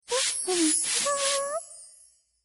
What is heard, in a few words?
sad cast